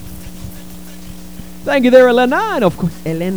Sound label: old vocals